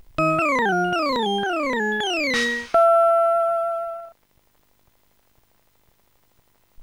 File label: stumble; Sonix Music Driver